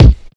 loud steel kick drum sound a sharp key, kick drum single hit tuned to the A# note